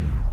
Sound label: v8 stop